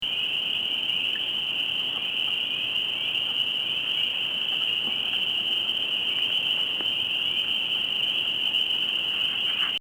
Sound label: peepers